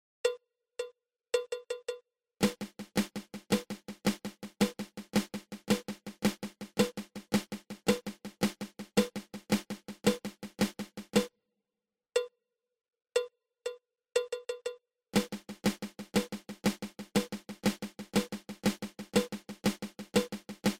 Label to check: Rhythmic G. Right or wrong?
Wrong